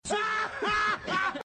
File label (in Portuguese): risada